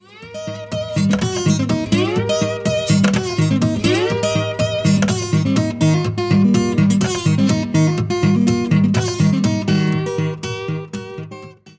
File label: blues